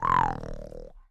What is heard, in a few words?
Tiger Cub